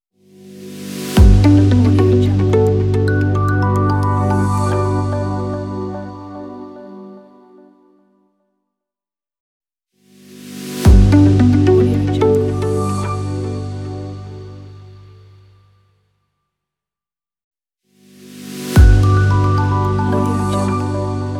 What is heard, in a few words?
minimal logo